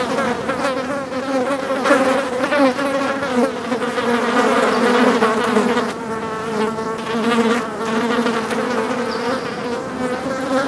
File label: fly loop